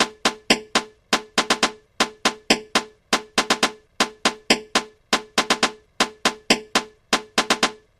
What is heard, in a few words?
Ambient House Top 120BPM